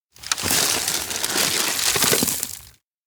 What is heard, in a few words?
timecapsule wrap plastic remove; anemoi